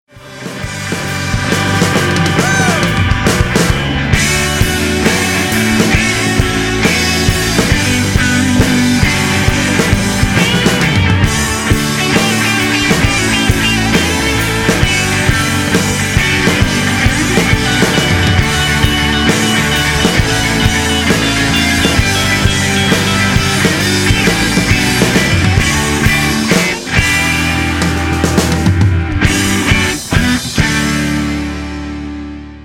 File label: Drums Organ Rhodes